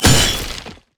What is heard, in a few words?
darius basic attack